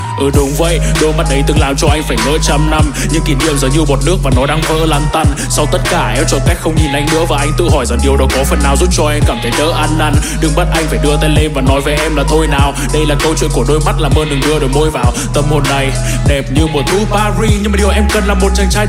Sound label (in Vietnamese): Nhac Chuong RAP VIET